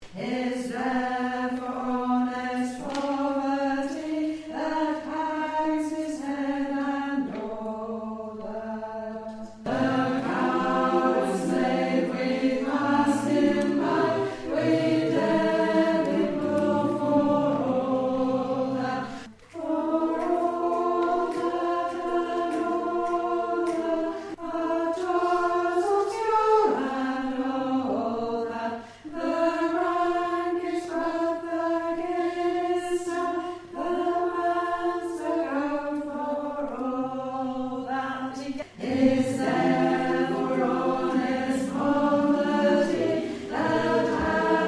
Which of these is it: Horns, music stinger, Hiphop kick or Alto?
Alto